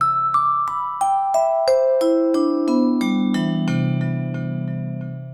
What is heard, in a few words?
Down Vibra, mallet